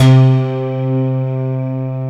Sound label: AIRY CLAV, swing synths; Zero-G - Funky Elements